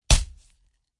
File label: slap fish